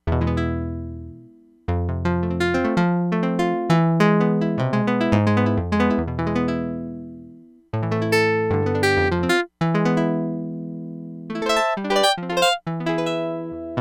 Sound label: GUITAR, Crumar Bit 99; Factory Programs